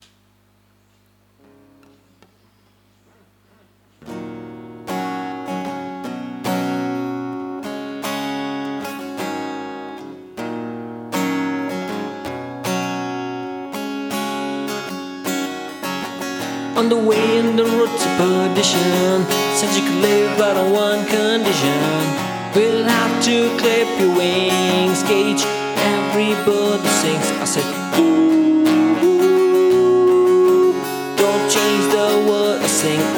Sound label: acoustic; road to perdition; Studio Sessions (2020)